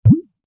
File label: bloop